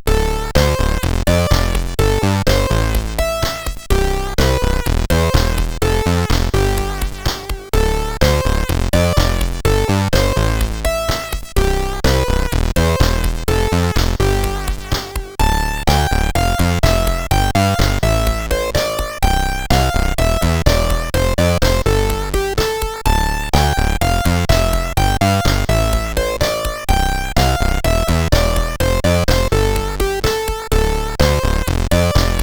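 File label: Lights On, MOS CSG8580R5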